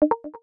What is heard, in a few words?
message; Garena